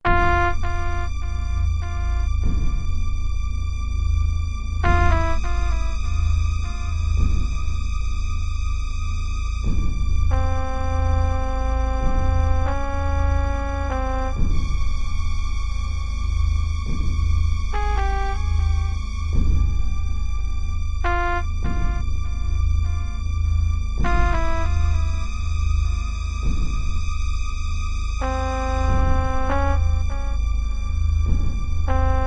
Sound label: swamp; KQ7